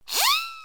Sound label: Victory Spin; emotes